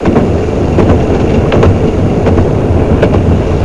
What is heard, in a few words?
run, Train; OpenBVE